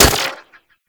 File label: Speeder Rifle Fire